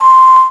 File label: flute C6, MIRAGE